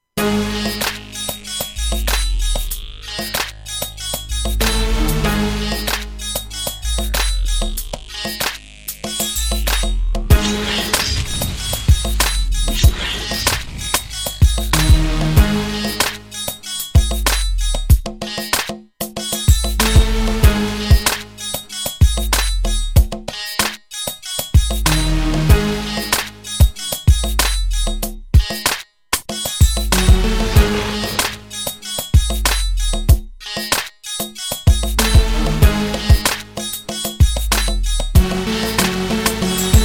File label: beat